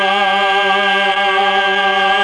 CHOR, Propeller Island Legendary M400

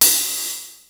Studio Open Hat, Ensoniq ASR-X Pro

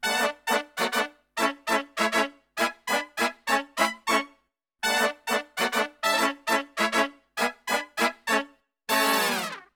BRASIL, Brass Super Section, BRASIL LICK; Best Service

